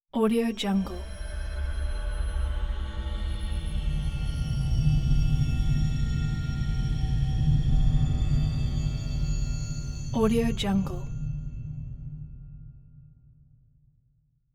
scifi musical accent; hollywoodedge

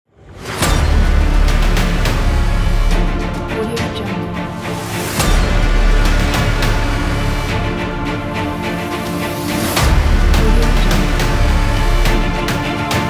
powerful epic ident

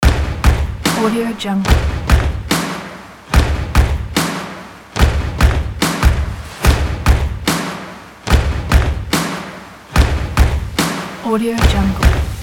stylish modern stomp rock

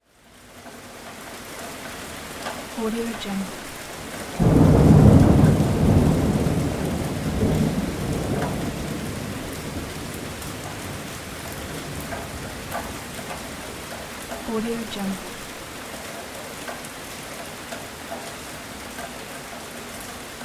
light rain with thunder

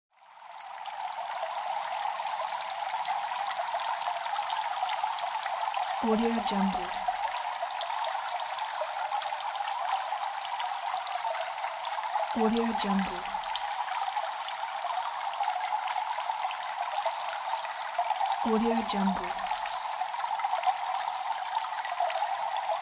river water flow

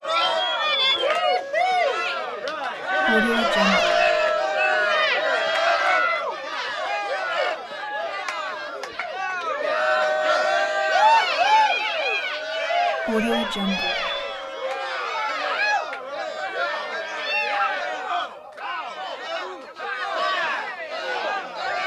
bar crowd rowdy yelling cheers sports; prosoundfx

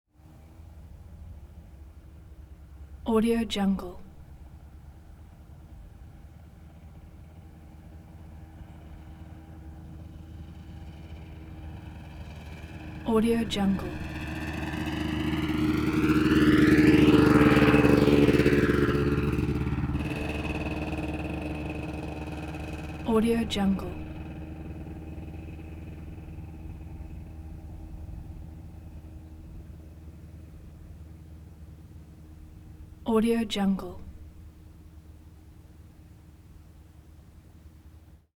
1991 kawasaki 200 motorcycle dirt bike

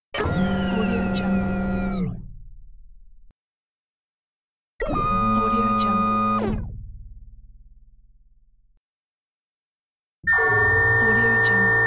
tech engines

